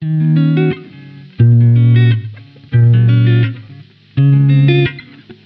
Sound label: 88bpm processed